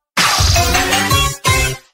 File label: Challenger Approaching!; Taisen Bomberman; Android